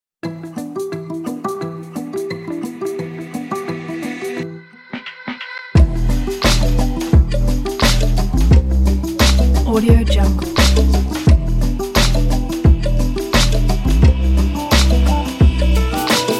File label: blissful; soundroll-music